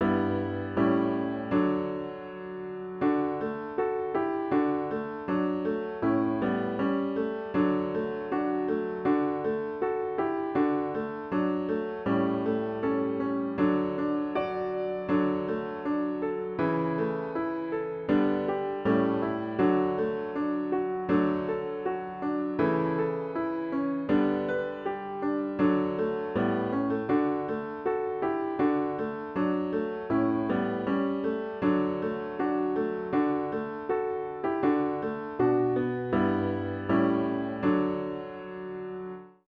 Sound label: piano; 2권 Old Song- DAYS OF ABSENCE